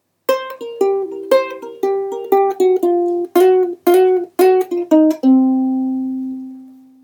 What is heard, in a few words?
c blues scale example, ukulele